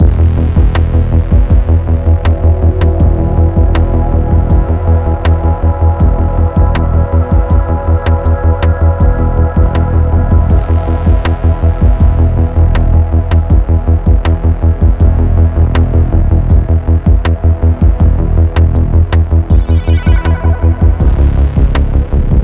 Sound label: Tense; Everspace 2